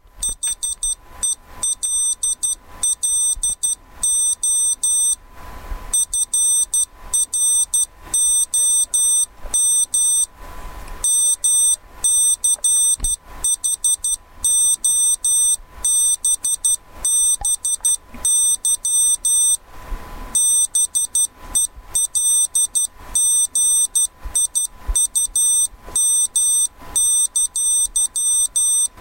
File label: arduino morse hello from